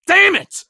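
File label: weapon reload; Escape from Tarkov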